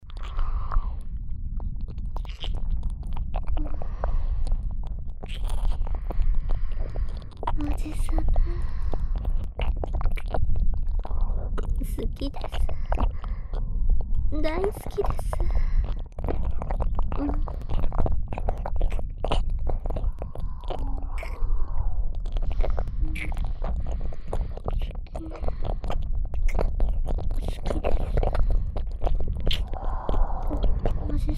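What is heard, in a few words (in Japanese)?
しゅきしゅき両耳舐めループ; ASMR; 耳塞ぎあり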